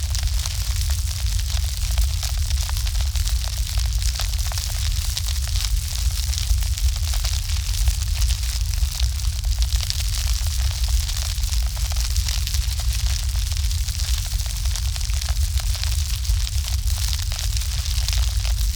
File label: wood fire meat